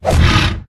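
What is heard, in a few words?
bear block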